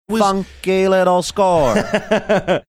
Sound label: singing funky little score